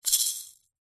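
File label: musical percussion shaker maracas kids single shake; zapsplat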